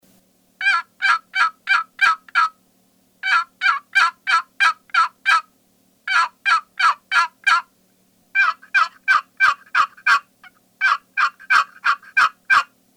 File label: Custom Deluxe Call Walnut; Porter